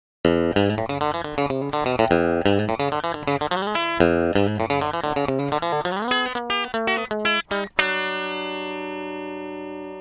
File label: chickin pickin, pedales